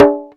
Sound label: bata